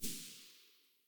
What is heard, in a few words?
aug silencer mono very far, weapons; STALKER; IWP